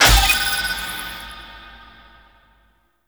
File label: Conservation Alerted; Warframe; Duviri